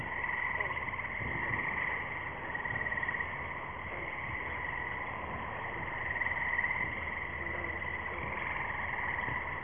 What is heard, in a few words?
lithobates clamitans